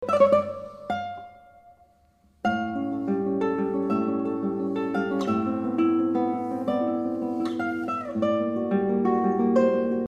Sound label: arpeggio; hoppstock; villa lobos